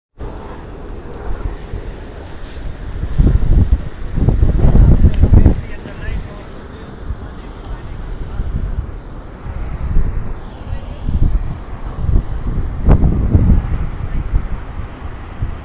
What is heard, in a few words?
great ocean road twelve apostles